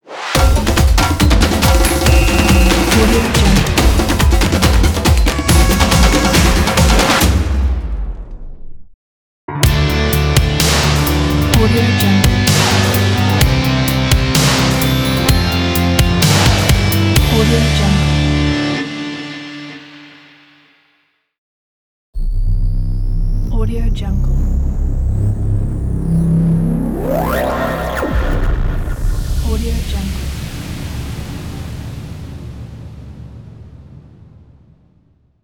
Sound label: energy logo pack; studiowx